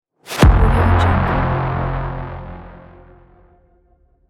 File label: cinematic braam; magicsoundeffects